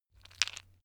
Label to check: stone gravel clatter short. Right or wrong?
Right